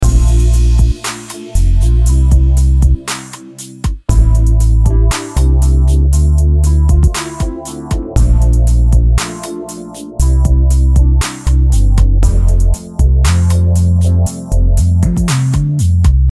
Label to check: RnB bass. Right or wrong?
Right